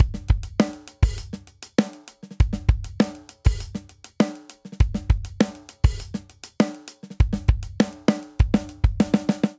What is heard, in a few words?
Linear Funk Intro 100 bpm, Drum; 4 4; MIDI Clips; Ableton; Factory Packs; Drum Booth